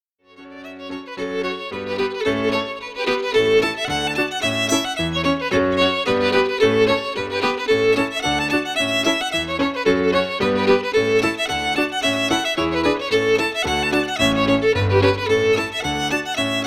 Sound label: Reels